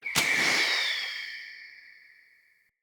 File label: Bow Arrow By Charged Echo; Weapons; Player; Bows; DendritBow